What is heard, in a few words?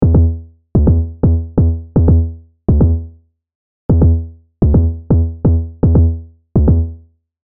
Kick Bass